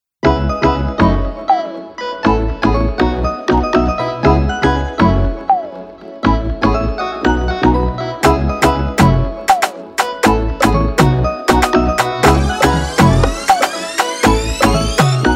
120 bpm afro intro, Afro Dancehall Type Beat Instrumental